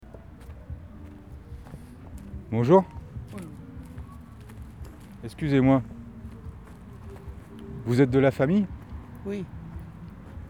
Violoniste

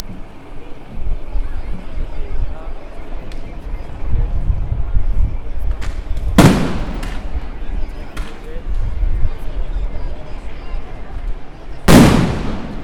ambiance manif

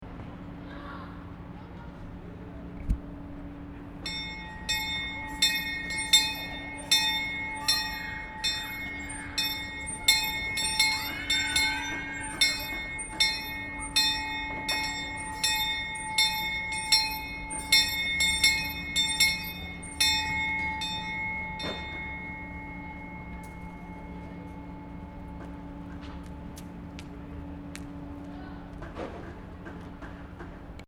bruit cloche

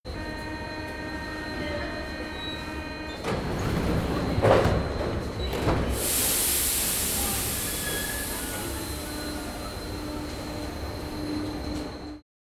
pshit metro

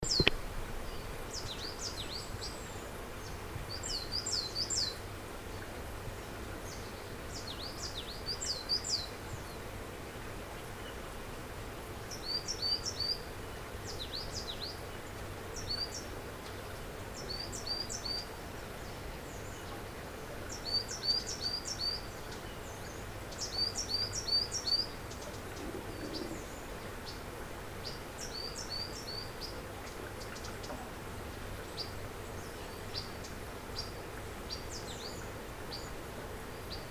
mesanges nonnettes

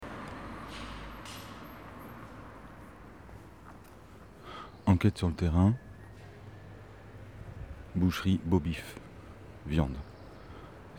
Boucherie

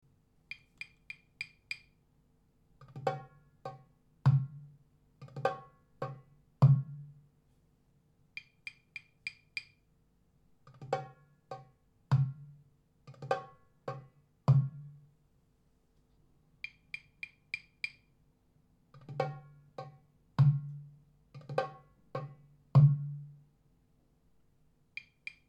percus echo; percus90; echo120

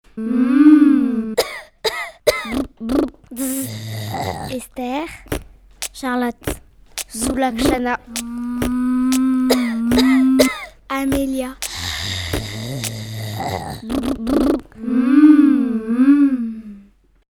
bruits de bouche

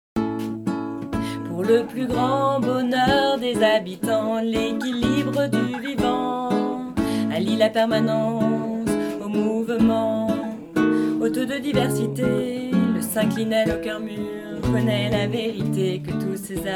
hymne du synclinal

